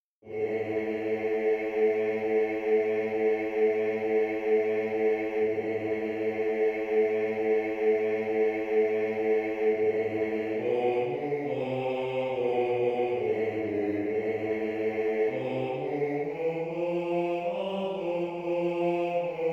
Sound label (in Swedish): bas